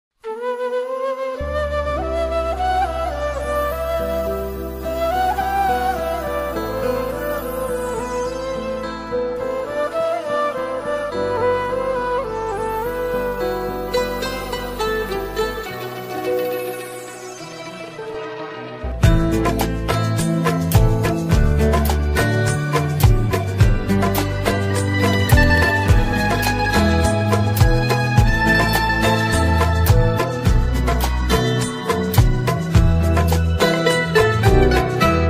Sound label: romantic ost ringtone flute instrumental ringtone; bilal abbas khan durefishan saleem